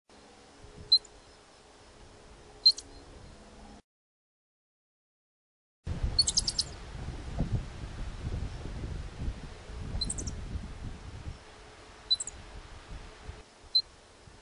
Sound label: California Ground Squirrel Warning Call; desert animals of the usa